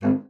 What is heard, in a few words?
bassoon G#1; Xsample VOL-6